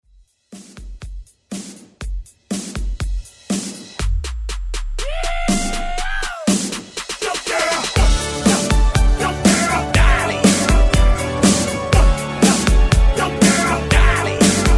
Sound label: ReDrum 121 BPM